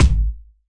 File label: kick